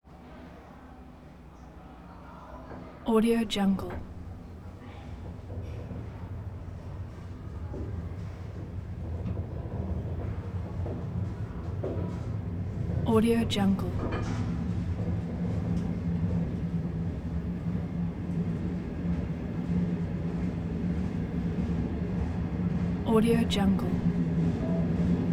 train vestibule onboard accelerate bumps

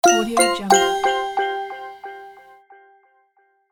new message

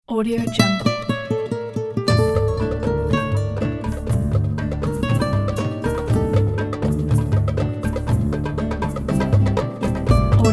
camel dance; ncmusic

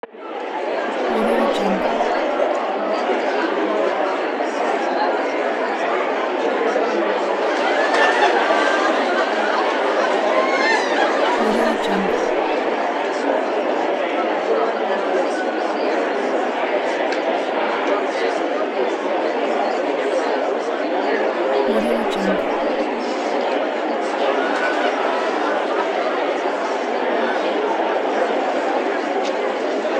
cocktail party ambience; timmarket

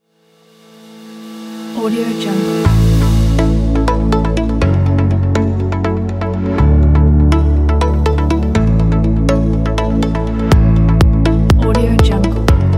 clean modern inspire

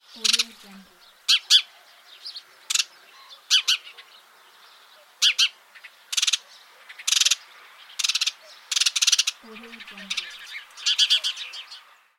bird in park

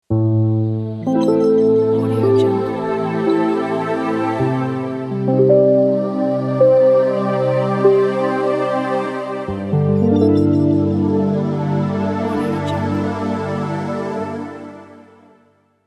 mysterious pads transition

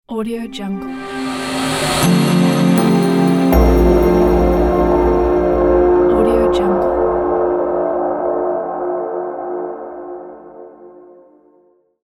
elite logo

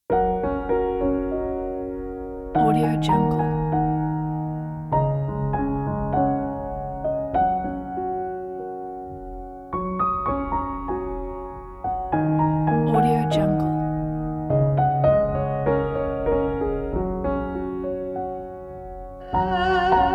anime piano erhu

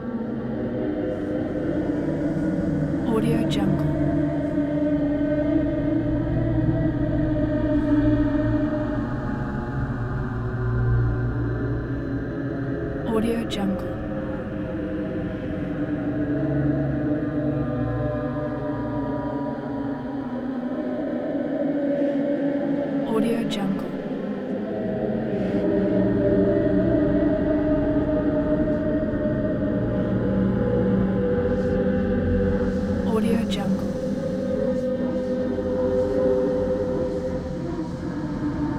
doom drones horror ominous voices; doom drones 2; soundmorph

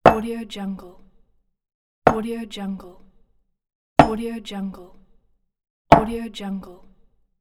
cup on table

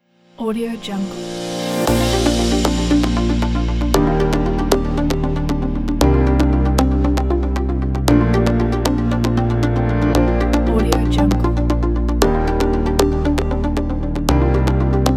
corporate waves

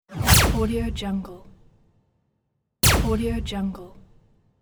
laser shot

